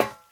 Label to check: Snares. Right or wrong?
Wrong